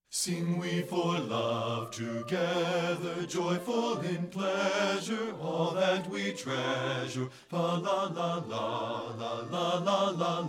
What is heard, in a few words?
SING WE FOR LOVE TOGETHER BASS; partbypart; satb